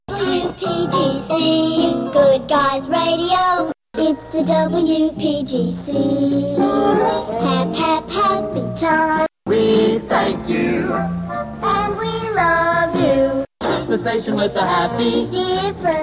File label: jingles the happy difference montage; pams series 25